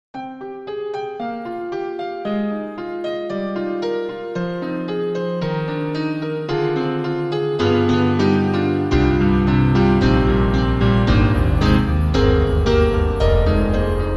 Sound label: Daydream Piano; KORG KRONOS 2